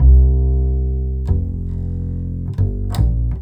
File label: BASS LP, Drum & Bass, 140 BPM; Ueberschall; Drum & Bass Flash Trackz